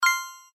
fire success; Adventure Time - Elemental; ThrillGames

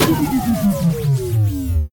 powerdown; Henry Danger - Day Of Danger!

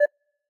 countdown; Ben 10 - Spot The Difference

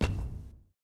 sfx footstep heavy wood; Ben 10 - Omnicode

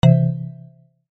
Hit Ready; Bunnicula - Crop Control

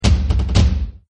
guard alert drum; Legends of the Hidden Temple - Unlock the Past

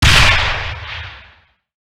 explosion; Dingo The Dragon

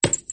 dodgeball; Looney Tunes - Recess

